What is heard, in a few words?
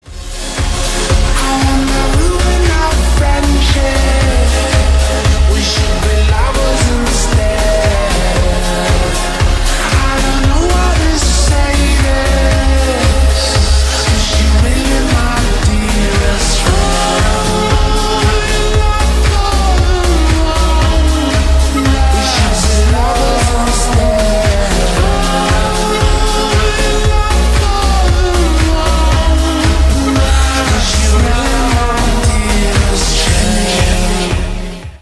slowed reverb